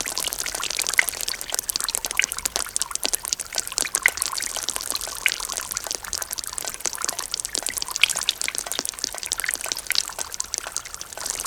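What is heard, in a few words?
hospital atrium water leak; spacial loops; ambient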